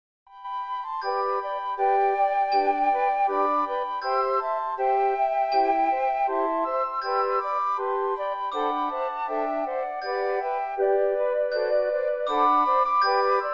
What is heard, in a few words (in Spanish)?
Duo de flautas Solistas y grupo de 4 flautas con glokenspiel; J. HAYDN – SERENADE; Op.3 Nº5